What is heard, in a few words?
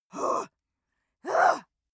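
female angry, screams; schreie; menschen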